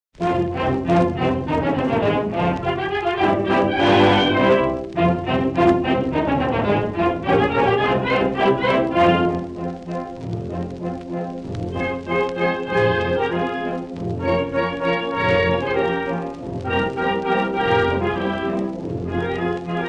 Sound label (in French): marche indienne